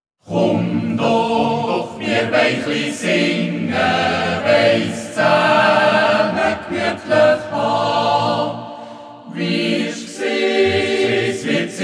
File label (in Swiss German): chum doch mier wei chli singe